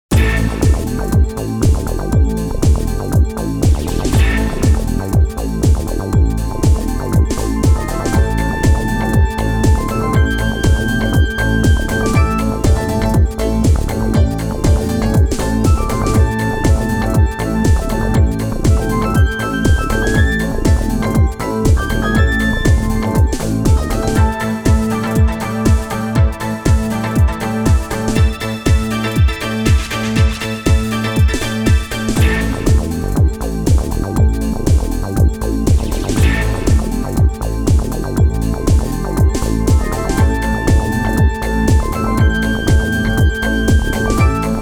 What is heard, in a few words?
Victory Warcry; sangokumusou original soundtrack 1997